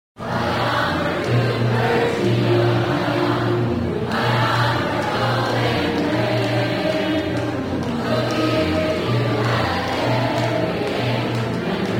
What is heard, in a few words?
Fight Song